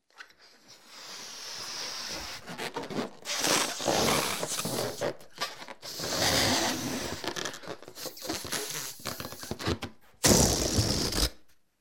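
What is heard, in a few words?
balloon is inflated, crumpled and released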